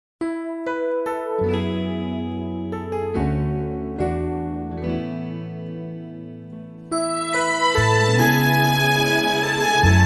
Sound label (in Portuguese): pagode e samba